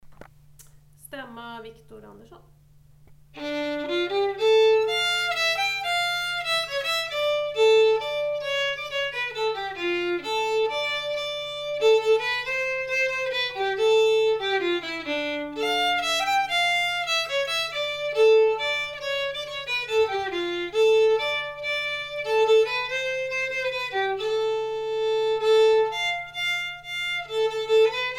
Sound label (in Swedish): Stämma; Viktor Andersson